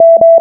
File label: error, sounds; dragonpilot; selfdrive